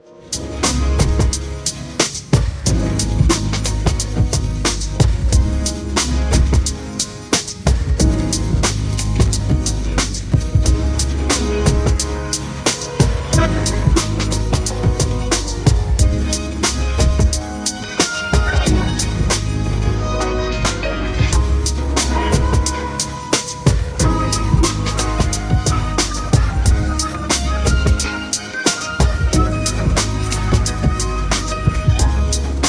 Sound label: hiphop